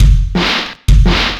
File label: LOOP, Drum & Bass, 170 BPM